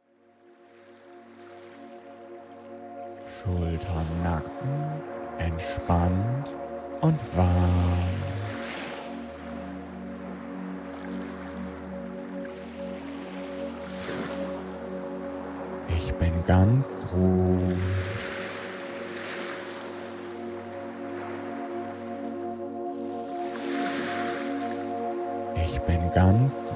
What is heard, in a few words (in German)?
Meer Melodie; autogenes training; wellengang